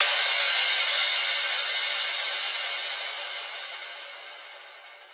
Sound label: Crash